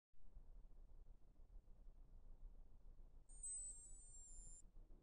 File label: tune no sleep mode